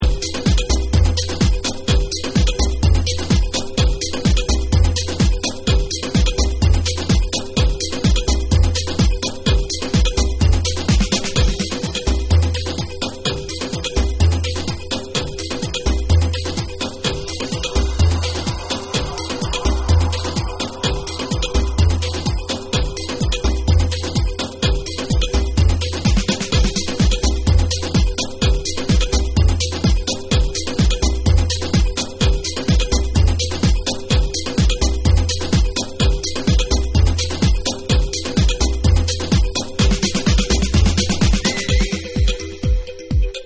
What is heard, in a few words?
DRUM DE VOODOO